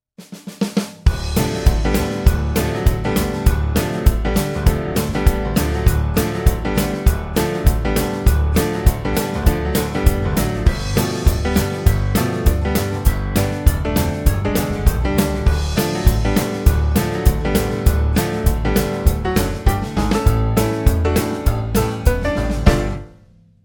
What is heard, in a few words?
country blues d